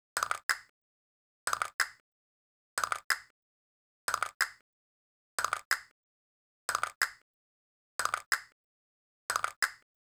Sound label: Castanets, 092 Cmin; Hip Hop; Hip Hop Exotica; Apple Loops; Big Fish Audio